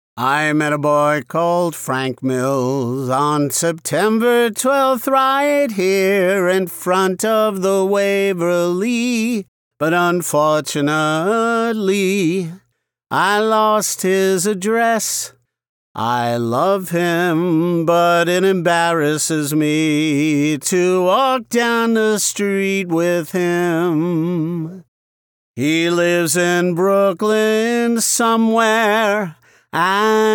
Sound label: a cappella singing sample